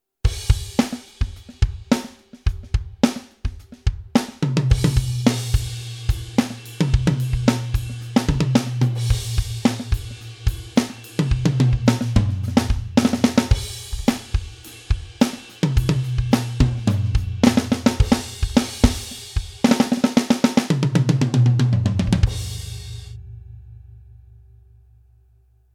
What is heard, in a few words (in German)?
PEARL Mimic Pro ohne Einstellungen